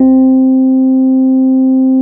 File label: Elec PA4, Pianos; Fairlight CMI IIe